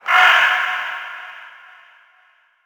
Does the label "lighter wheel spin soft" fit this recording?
No